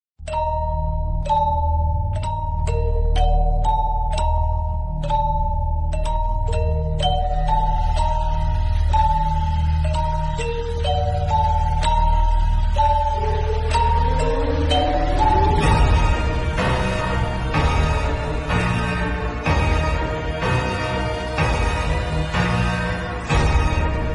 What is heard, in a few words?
Villain Bgm Ringtone; Rakshasudu